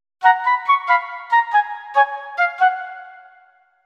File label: jingle